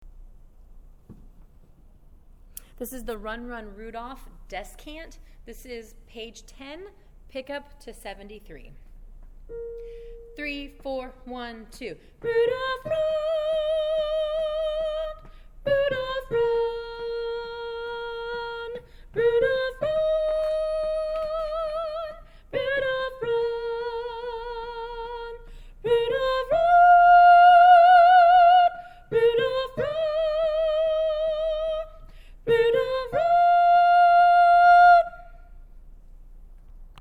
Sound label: descant, children's choir